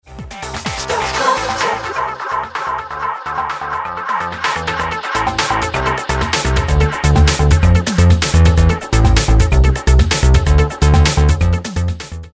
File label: Filter Mid Pass